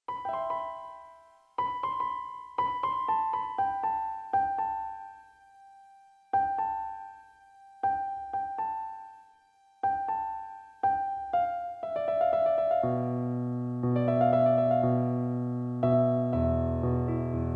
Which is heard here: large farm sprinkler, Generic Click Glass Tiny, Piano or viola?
Piano